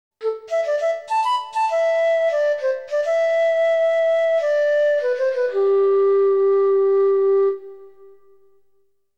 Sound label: Soldier's Flute; Perfect Collection Dragon Slayer The Legend of Heroes II